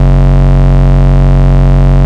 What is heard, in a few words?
Ultimate Bass Root F#; Vengeance Samples